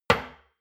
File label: shot glass place table hard, gastronomie; soundpool